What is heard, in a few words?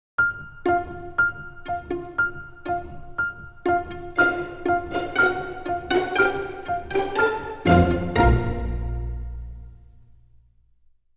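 Dramedy Sting, No Percussion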